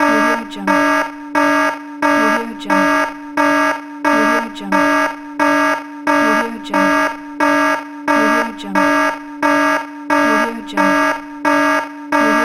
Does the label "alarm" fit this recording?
Yes